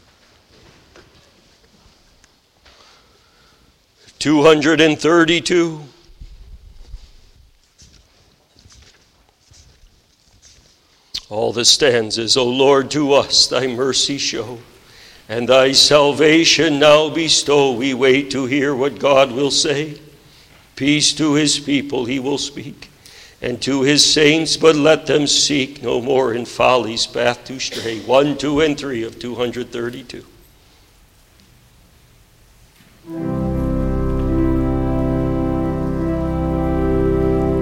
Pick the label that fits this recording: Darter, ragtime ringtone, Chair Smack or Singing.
Singing